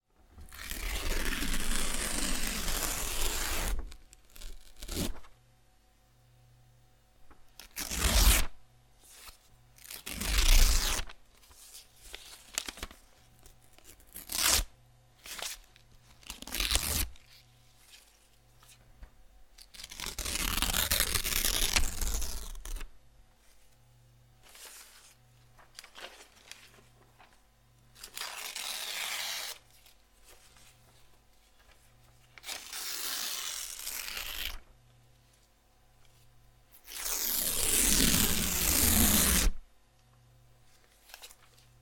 paper

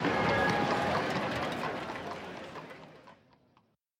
city sound

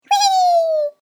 happy; Flashpoint; tobiidynavox; catchme

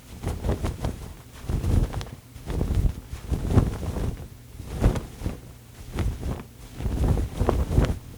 flag flap; pond5

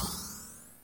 key panel let go, puzzle; CrossCode

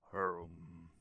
hrmm; halloween

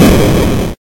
boom

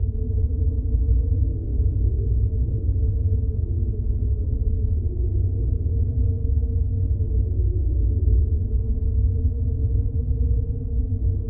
background main loop; The Jackbox Party Pack 5

